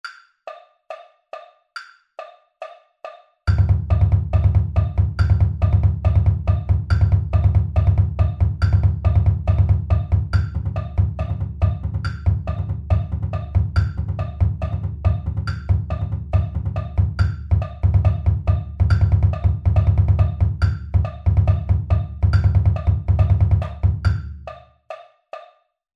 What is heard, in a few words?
Triple Beat 140 bpm Bass